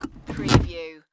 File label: Auto Emergency Brake; sound effects bible